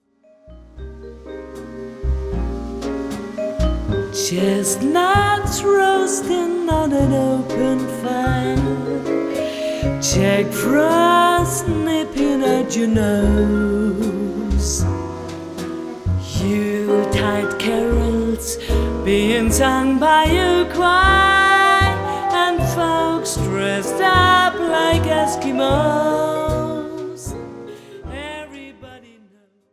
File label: christmas song